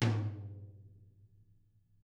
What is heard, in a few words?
HIT, Drums; DW12